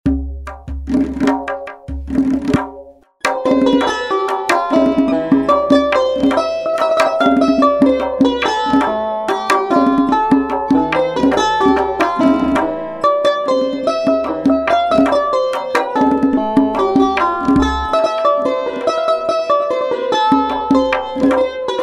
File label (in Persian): تار و تنبک